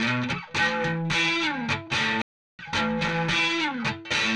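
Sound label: Distortion Riff Guitar; Guitars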